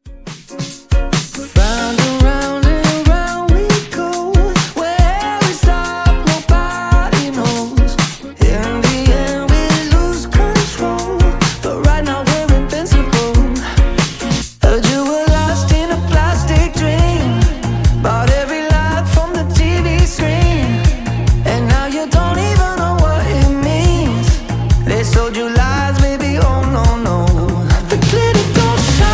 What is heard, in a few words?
Dj Intro Outro 140 Bpm; Dj Intro Outro Edit